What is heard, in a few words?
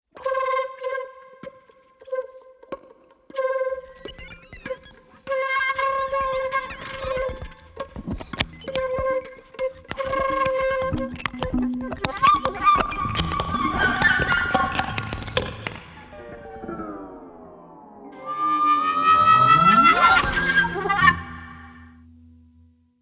Guitar Flute